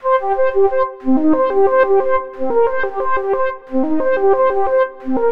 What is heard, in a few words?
90 Cm Synth Flute, Melodic Loops; Electronisounds